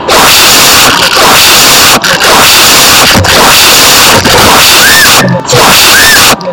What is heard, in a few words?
asmr